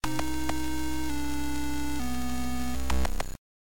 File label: game over; Añadida musica a la pantalla de game over